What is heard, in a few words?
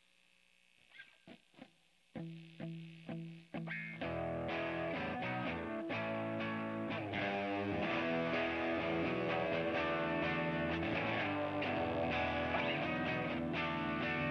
riff